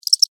Insect Cricket Chirp Single; Insect Voice (Sound Effects:Animals:Insects)

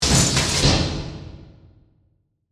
Mechanical Stamp, Press; Scifi; Science, Fiction; Spacecrafts; Blast Wave

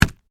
Sparring Glove, Impact, Hit, Punch; Sports; Boxing Sound Effects; BlastWave

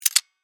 Steampunk Switch; Science, Fiction

